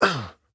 hurt; STALKER; IWP

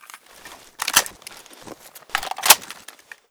svu reload, weapons

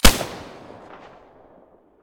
mosin silencer stereo, weapons

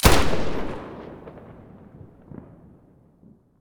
muzzle fn2000; stereo

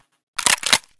m590 pompa, weapons mossberg

